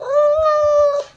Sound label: cat Meow; DeadFright